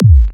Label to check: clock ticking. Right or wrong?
Wrong